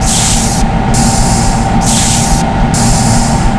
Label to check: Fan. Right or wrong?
Right